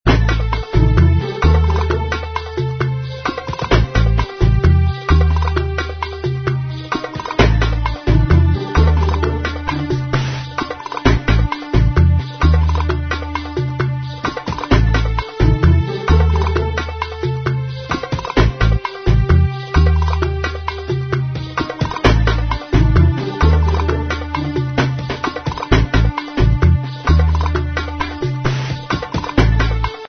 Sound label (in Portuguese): dança suspense